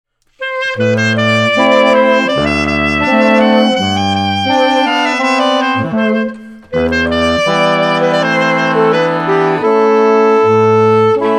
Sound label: Romanian Folk Dances